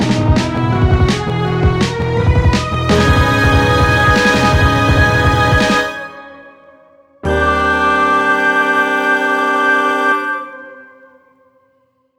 Deranged Ending Modern 166bpm, Stingers; Ultimate Everything Music Bundle; Stingers, Blips and Dings

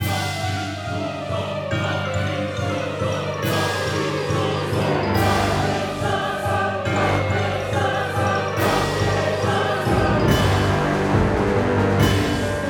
BATTLE BOSS LOOP 140bpm; SAGA Complete Collection v2.0; SAGA III - Cinematic Normal & Boss Battles; Kraken Attack

